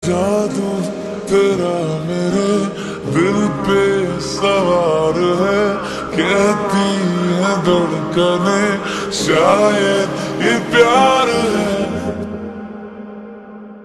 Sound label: Ringtone, slowed and reverb